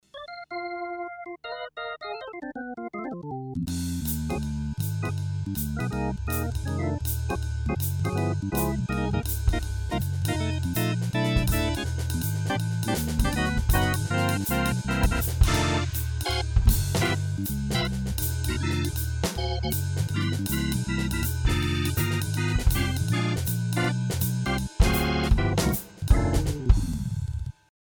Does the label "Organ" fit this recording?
Yes